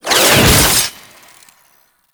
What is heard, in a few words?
Kuva Lich Finisher Parazon Stab In; Warframe